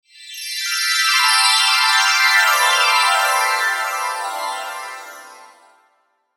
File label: Pixie Dust Long Shimmer Descend Chimes; Magic